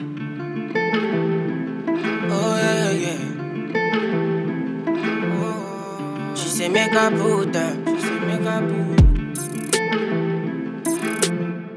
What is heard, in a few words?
Instrumental, afrobeat instrumental